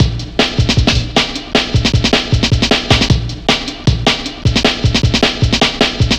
Drumloops, 155bpm; Drum Bass; Zero-G; Total Drum Bass

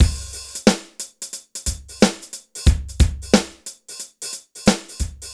BPM 90 DRY; AKAI S-Series CD-ROM Sound Library VOL-4

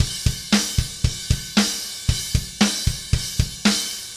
Haze Beat, Indie Rock; Sonic Foundry (Sony Creative Software); Indie Rock Anthems; Session 06 - Haze

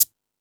Beat Box, E-MU Pro-Cussion

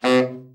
TENOR SN, Sax; Giga Samples Collection

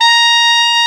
BRA TENOR, SAX; Best Service Gigapack 1; BR SAX 4

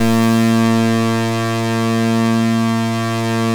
EMU MODULAR, modular syn

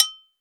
Agogo Hi; Roland BK-5; Percussion

